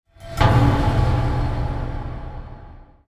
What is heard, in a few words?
Waterphone Knock; Sonic Foundry (Sony Creative Software); The Electronic Music Manuscript Collection